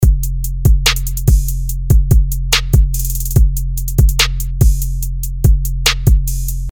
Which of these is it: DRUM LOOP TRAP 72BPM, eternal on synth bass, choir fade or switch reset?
DRUM LOOP TRAP 72BPM